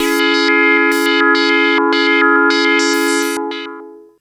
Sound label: Obi Minor Chord; Electronic Point Blank